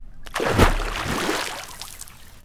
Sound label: Water; TOOLKIT vol.2